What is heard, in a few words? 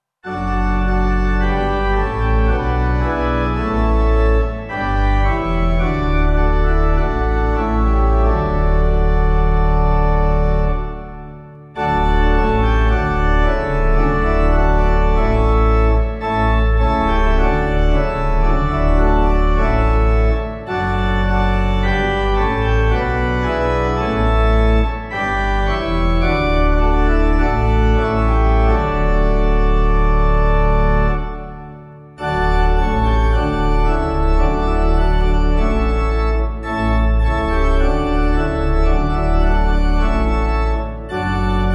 organ; Savannah; Hymnal 1982